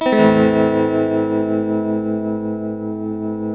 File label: TELTMUMIN A#, TELE CHORDS, Guitars; Spectrasonics; Hans Zimmer Guitars VOL-1